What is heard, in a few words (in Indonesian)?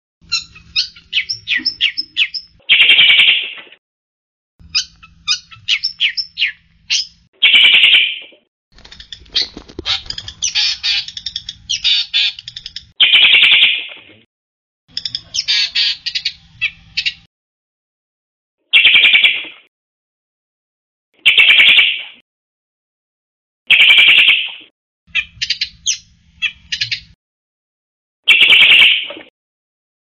Suara Burung Srigunting Gacor; untuk Pikat dan Masteran